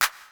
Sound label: HIP HOP, ENSONIQ TS-10; Rubber Chicken Software Sample Bank